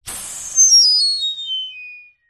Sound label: foley air puncture air release hiss whistle; zapsplat